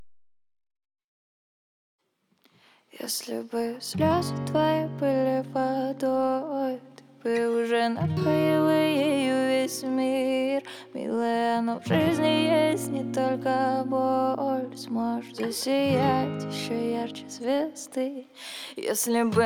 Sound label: acoustic version